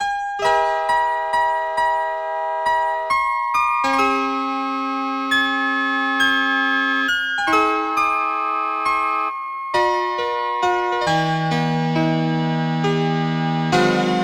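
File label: background music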